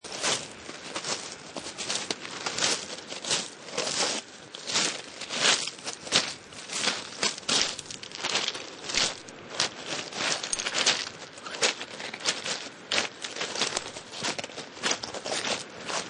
Footsteps On Rocks, Person walking on rocky riverside; lots of small rocks and pebbles being shifted under feet; Footstep Sound Effects; LynneMusic